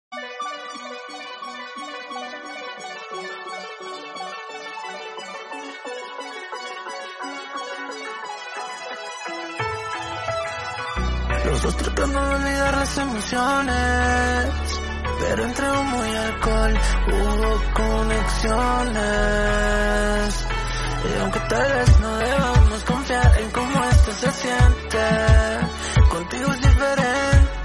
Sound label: Soundtrack Spanish Song